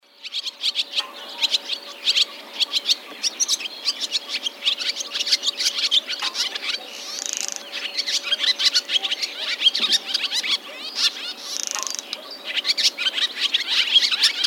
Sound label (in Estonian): suitsupääsuke; spring; linnud